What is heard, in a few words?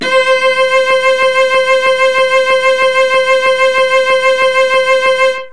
CELLO